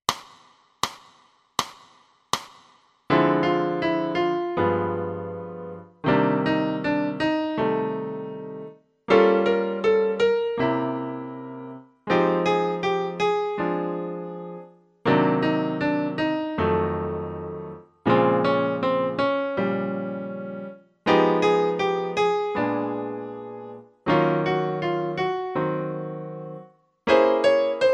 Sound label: piano